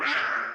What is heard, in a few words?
Cat Attack; Unsighted (Switch) (gamerip) (2021)